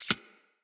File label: Arachnoid Micro Step; Enemies; Corpus